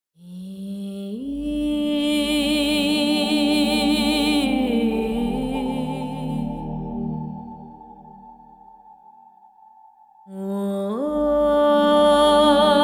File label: Voice